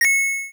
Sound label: answer correct; yumpod